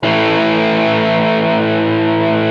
GTR A MAJ, ROCK GUITAR; Greytsounds VOL-1; ROCK GUITAR2

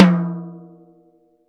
TOM, Drum Dry; East Collexion; Drum 1

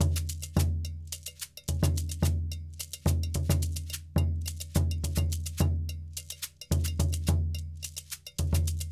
Djoun, World Percussion; Bell, Shaker

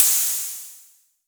HHo Blofeld; Waldorf

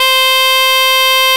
CHANTER C2, Exotic Winds

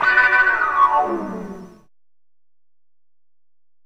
Wah Stab; Sonic Foundry (Sony Creative Software); Club Joints and Street Anthems; Game Time

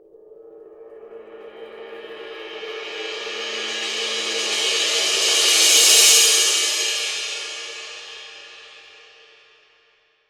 Crash Cymbal, 22INCH IST MLT ROLL

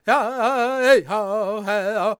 C# MIN, World Voices, LAKOTA

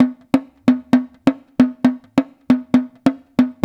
JU JU, African Contemporary

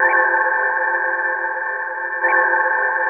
GRUNGE; AMG - Vince Clarke Lucky Bastard

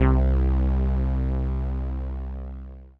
505, String Machines

